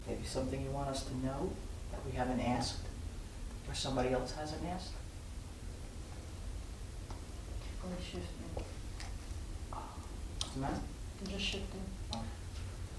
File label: breath ah